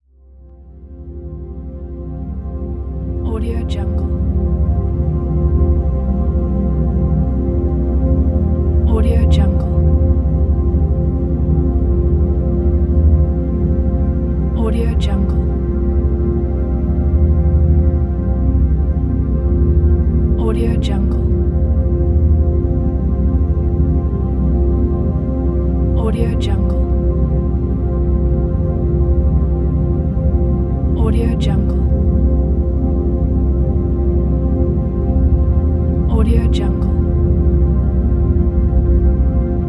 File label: drone; aurasonic